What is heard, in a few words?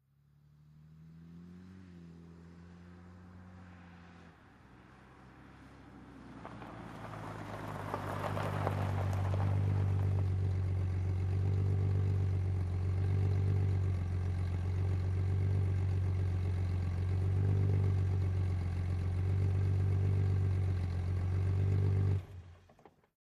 Bmw 530i Slow Stop